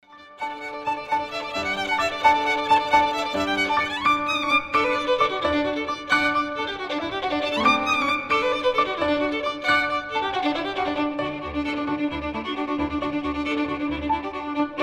hoedown

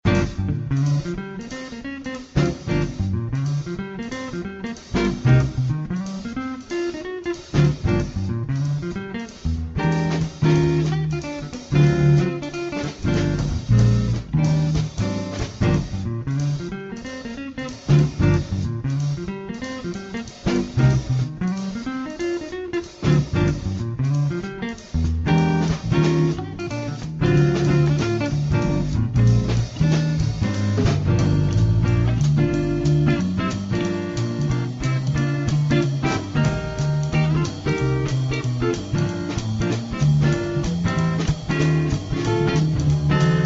jazz

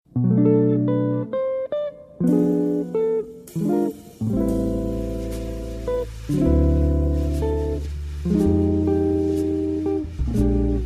jazz lull

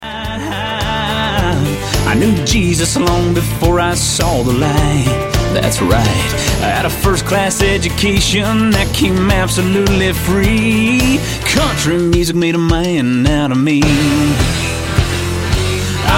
country music

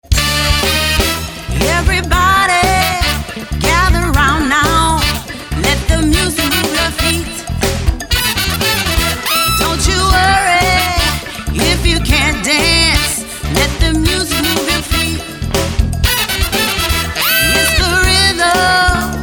up conga

